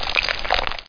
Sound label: FALL; xpired